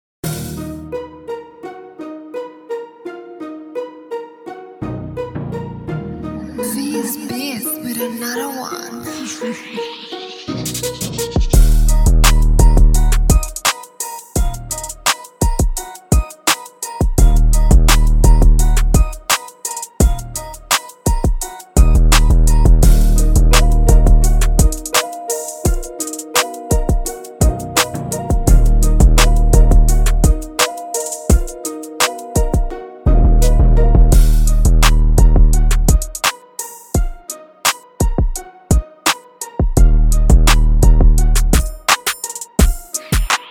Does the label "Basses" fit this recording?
No